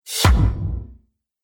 Cinematic Hit, Trailer Sound Effects; sound design

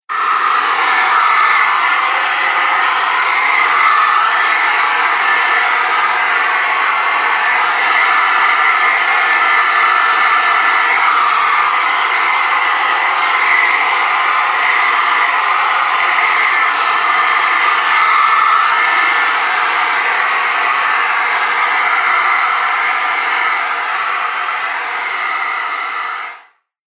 Hairdryer Long Blast; Bathroom Sound Effects; Foley Sound Effect

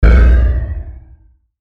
Hit Bass Flange Wah; Blastwave

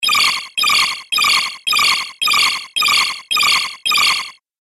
Retro Game Repeating Sound; Video Game Sound Effects

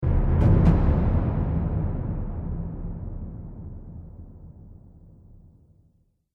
Orchestra Horror Sting; Stinger

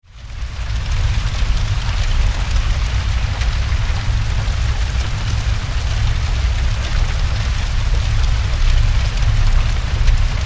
Horror Hell Fire Crackle Blaze; Scary Soundscapes

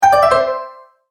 multimedia tone alert; Tones; Sound Design; McKinney Sound

